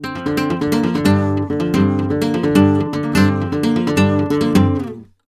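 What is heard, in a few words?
arpeggio guitar, Flamenco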